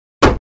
Ax Impact Into Wood; Crashes Impacts

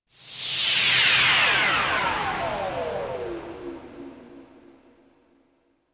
POWERSHOT

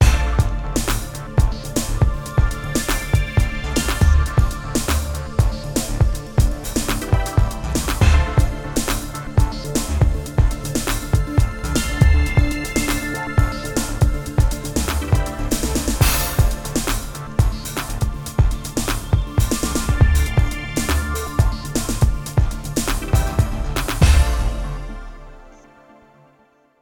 Hard-hitting news

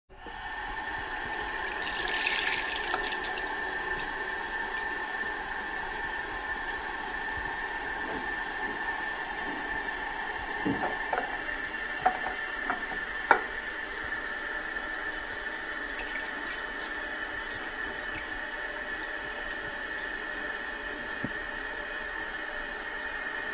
Turn on Faucet Run Water Into Sink & Turn Off; Household

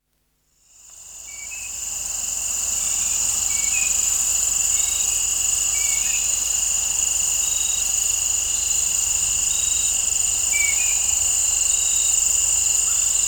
Crickets and Birds In an Open Field; Dr. Sound Effects; 210 Nature Sounds - 20 Hours of Relaxing Natural Ambiences for Meditation and Sleep

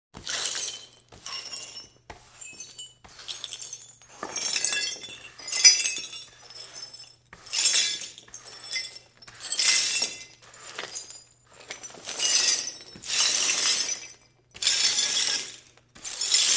Sweeping Up Broken Glass; SOUND EFFECTS1

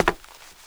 hardwood, footsteps; 1000fx

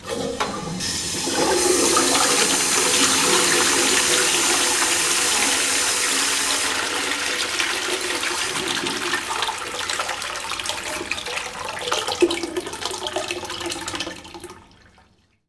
W.C. FRUSH, TOILET; 1000fx

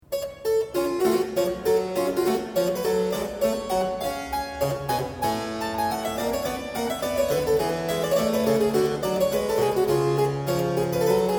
Suite in G Minor - Aire, Keyboard Music; Colin Booth; William Croft

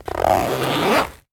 ZIPPER

